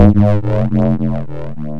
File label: Bass Hit